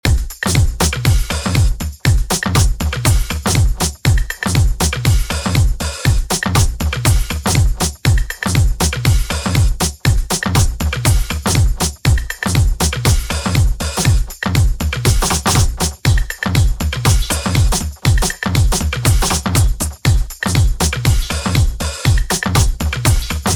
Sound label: BETTER DRUMS EXAMPLE SATURATED